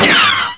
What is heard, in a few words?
magic